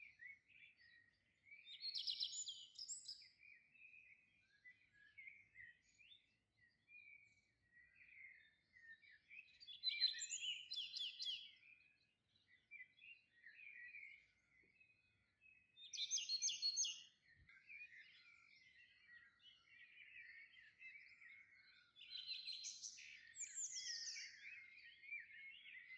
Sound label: Street sounds